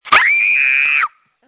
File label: screams